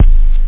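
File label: BASS DRUM; DRM PERC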